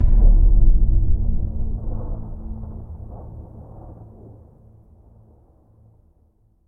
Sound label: Combo; Mixolumia